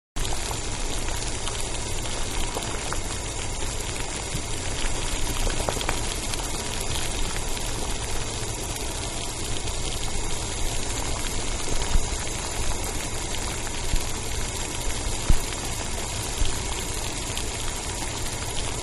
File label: Water Boiling In Pot With Gas; Food Prep; Restaurants; Manhattan Production Music